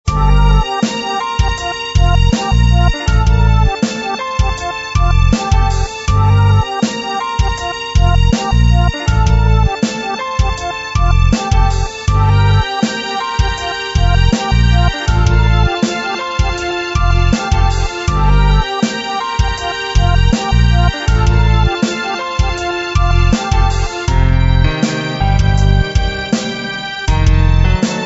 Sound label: moving groove